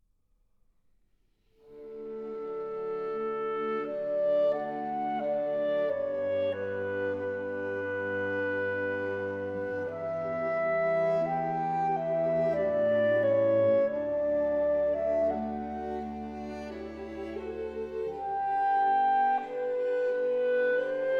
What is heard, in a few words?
Clarinet Quintet in A major Larghetto; KV58 II.